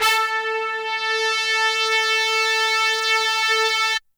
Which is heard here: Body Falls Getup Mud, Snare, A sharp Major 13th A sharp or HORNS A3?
HORNS A3